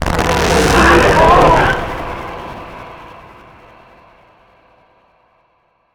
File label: JOVE damage